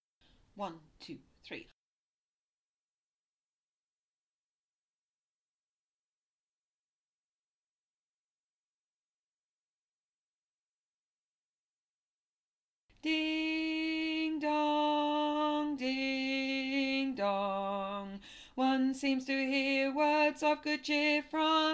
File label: CAROL OF THE BELLS TENOR